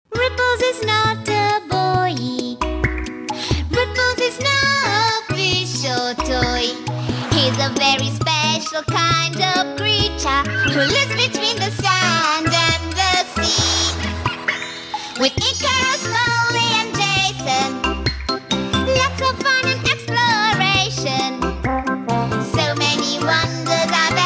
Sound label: italian female singing